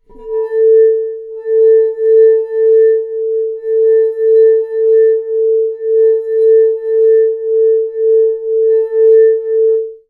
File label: Finger Rub, Large Wine Glass